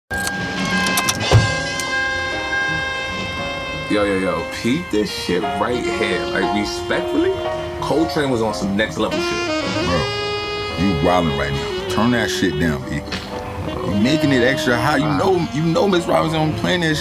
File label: Some Jazz Playing